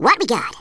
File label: hmm; worms2